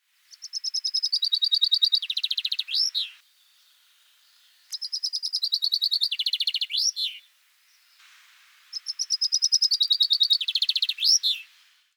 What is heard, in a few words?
Fringilla coelebs Chaffinch young